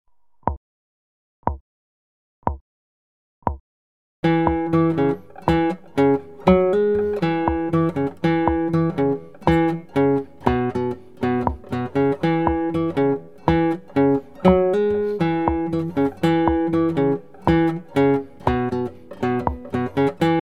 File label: riff